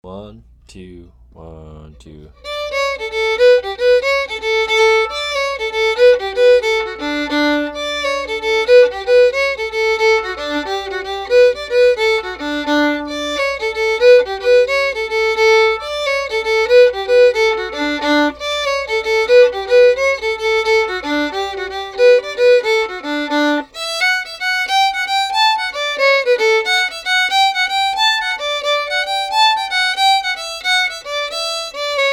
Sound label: jig fast